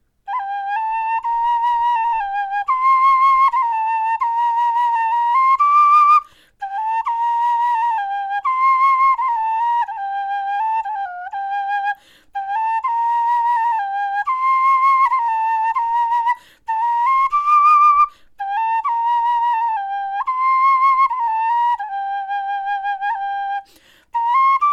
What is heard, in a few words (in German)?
melodie, knochenfloeten